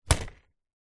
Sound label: foley basket hamper picnic set down on hard surface; zapsplat